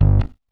bass stab

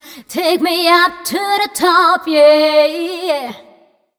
f mel f, fem Vocals

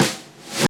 REV SNARE; Back In Time Records; Big Bang

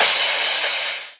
Crash, Cymbals

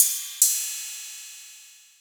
808 trap Ride

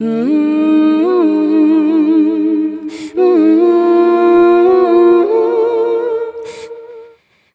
f mel e flat, fem Vocals

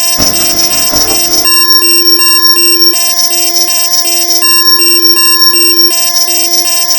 NOISE; Synth FX Misc; E-MU Producer Series Vol. 8 – Platinum Phatt

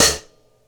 Vintage Closed Hi-Hat; KJ Sawka; Drum n Bass & Beyond; Drum Tool Box

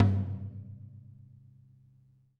R B Rack Tom B Close; Toms; Sonic Foundry (Sony Creative Software) - Drums from the Big Room The Mixes; R&B One Shots

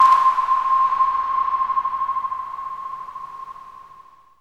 SONAR, SYNTH FX; AMG; Global Trance Mission VOL-2

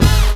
RAP HIT; Kid Nepro - Elite

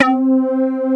inner wood; Greytsounds; Scott Peers Sound Engineering

